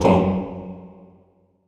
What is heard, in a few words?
CHO, Roland